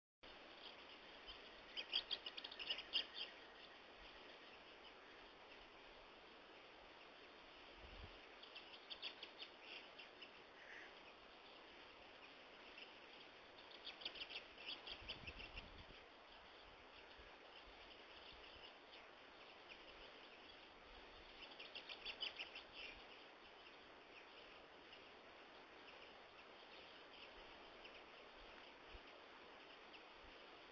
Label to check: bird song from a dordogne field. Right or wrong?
Right